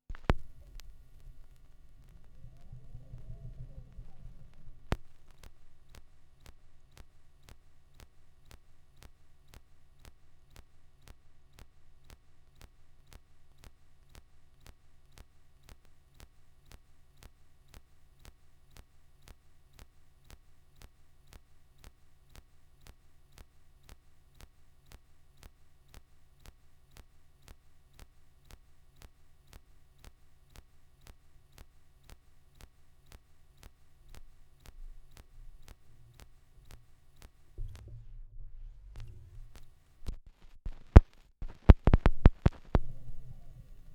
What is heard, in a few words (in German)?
schallplattengeraeusche, Vinyl; wendelrekords 02